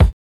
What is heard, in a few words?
boom kickdrum sound E key, loudest frequency: 246Hz; kick drum samples